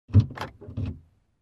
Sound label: Opening and Closing compartment in car